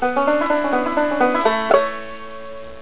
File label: BANJO